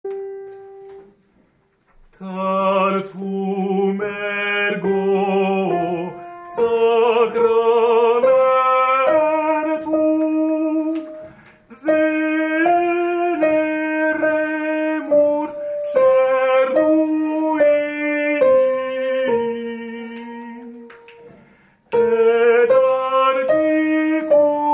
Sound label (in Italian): Soprani; Tantum Ergo Sévérac